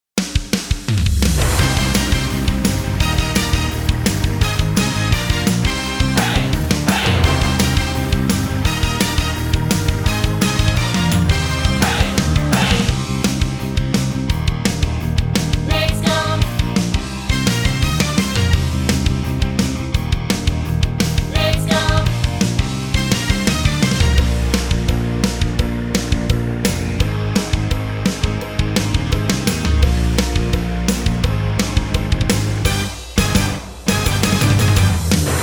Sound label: karaoke